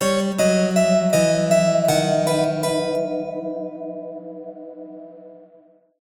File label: Music Bourree